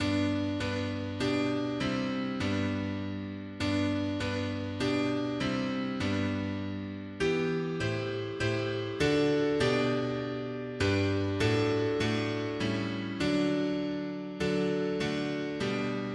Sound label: mono piano; hymns; Himnario grande